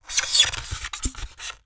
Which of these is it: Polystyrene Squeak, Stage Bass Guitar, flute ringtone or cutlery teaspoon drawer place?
Polystyrene Squeak